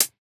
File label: hihat, house